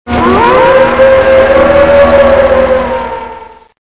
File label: wolf howl